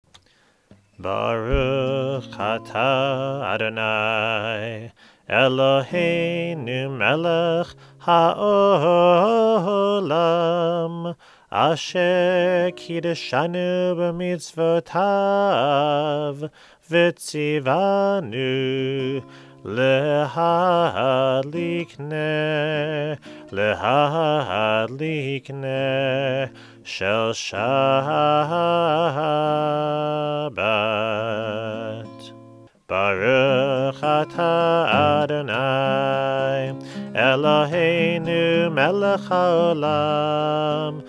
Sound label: More Candle Lighting Melodies